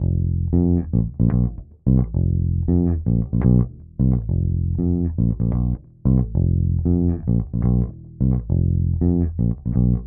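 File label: XPRESSOR VTC WET guitar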